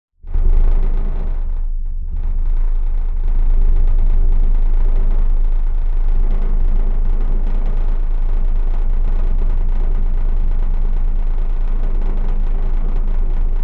vehicles ship internal metal wall floor or surface vibrate rumble rattle